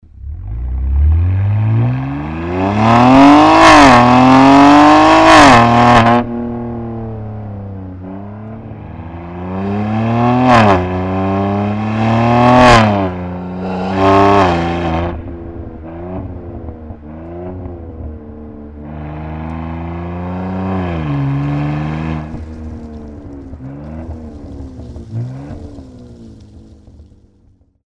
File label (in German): BMW X4 M Competition Cat Back System RACING OPF Ersatzrohr RACING ESD; REMUS